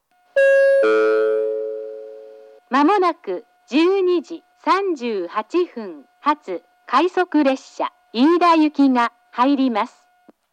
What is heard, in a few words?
rapid; minami-matsumoto; iida; jr-east